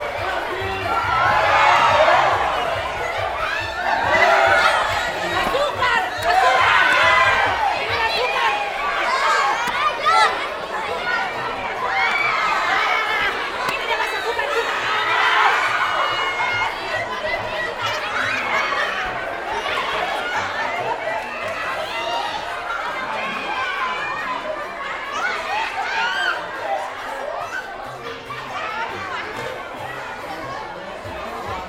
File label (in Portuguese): Apresentacao palhaco com musica e plateia reagindo com gritos; multidao